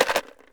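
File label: ELM RATTLE, PERC; AKAI S6000 CD-ROM - WorldSounds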